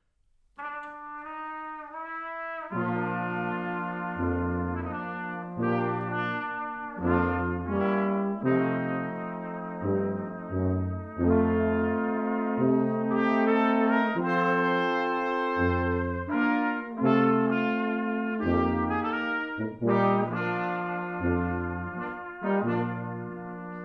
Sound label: Just a closer walk with thee, trompet, horn, basun og tuba; royaldanishbrass